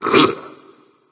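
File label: nonverbal ugh; smite; voicepack; merlin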